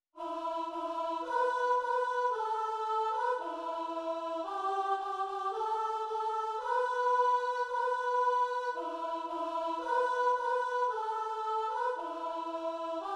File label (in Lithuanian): SOPRANO Šventas ŠV AUGUSTINO MIŠiOS